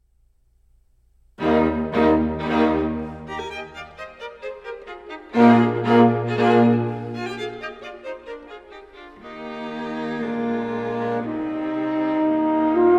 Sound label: Quintet For Violin, Two Violas, Violoncello and Horn in E-flat Major; Allegro; Chamber Works For Winds And Strings